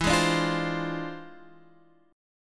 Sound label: E 7th Flat 9th Sharp 5th; E7b9#5; strummed